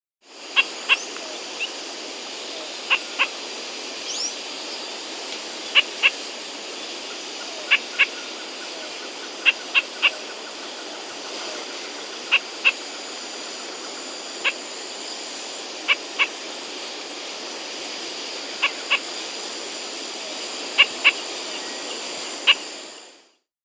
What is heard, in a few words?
Cabanis' Spinetail, Synallaxis, Nature's Songs, S. America